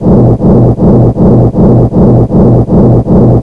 POUNDING; ENVIRON

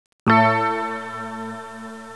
KLICK

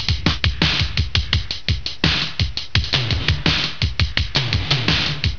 DRUM

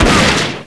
TANKSHT; THEXDER

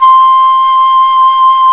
FLUTE HI; INSTRMNT

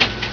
click

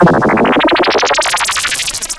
DAWEEP

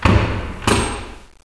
BIG DOOR

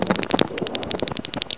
chewing

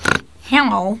SNORT

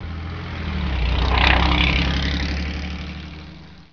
loud car

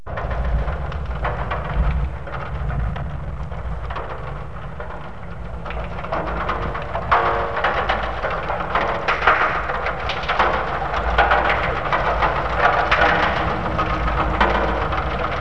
SCIFI

